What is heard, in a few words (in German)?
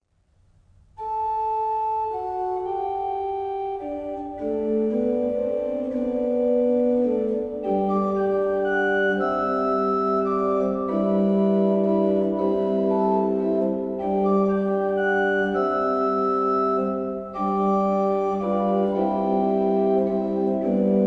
orgelvorspiel; Hörbeispiel "Jesu Herz, dich preist mein Glaube" GG 849